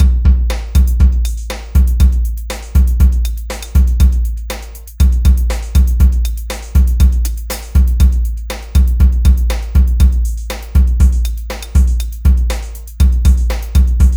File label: Beat, Hip-Hop